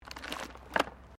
JUNK, Debris

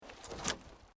Wood Logs Movement Minor; Materials; Material components for constructing more complex sounds

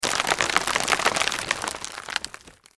Crunch Grind Rock Salt; Crunches; Blastwave; Foley

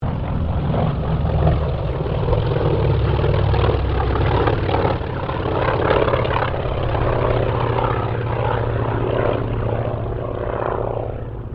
AIRCRAFT TROJAN SQUADRON 4 PASS; Mixed aircraft squadrons; Airplanes; Sound Effects:Vehicles

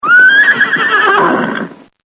Horse Whinny; Horses; Partners In Rhyme

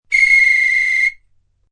Referee whistle blows.; Whistles; Objects

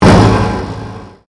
Convolution Pulse; Sound elements to create more complex sound effects; Swell Sound Effects; Swells (Sound Effects:Sound Design)

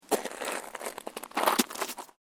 SNOW CREAK

